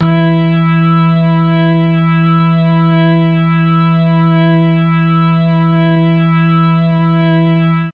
E2 organ, MKII mellotron

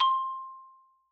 Xylophone Soft C5 ff far, Soft Mallets

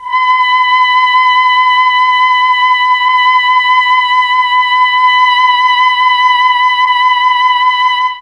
B3 female, mellotron; CHMB FEMALE

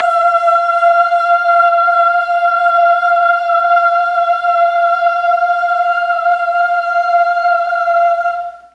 "F3" boys choir, mellotron

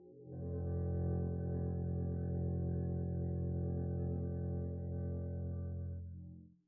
arco f1 pp up, double bass; dsmolken

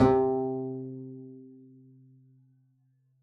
pizz c3, double bass; dsmolken

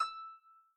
Harp Normal E5, Folk Harp, Composite Chordophones

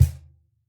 Cajon hit mp; Idiophones; Struck Idiophones

